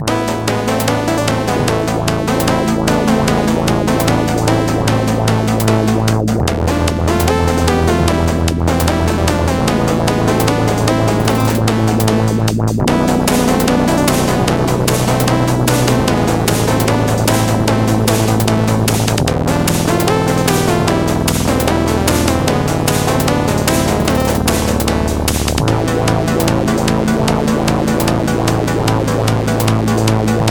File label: tetris dubstep